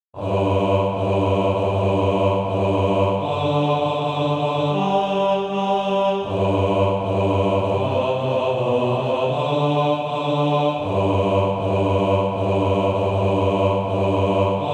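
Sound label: bass, Sheet music (SATB) including Practise Music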